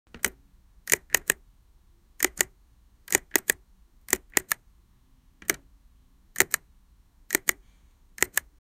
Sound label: click sound effect